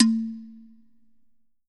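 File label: E3, Slit Drum; Drums Percussion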